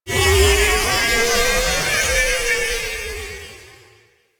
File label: Landscape Textures, Ambience; Venus; Objective Rooms